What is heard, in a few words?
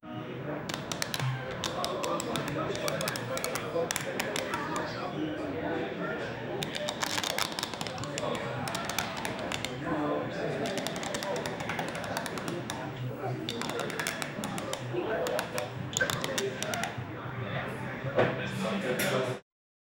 blackjack vibe lounge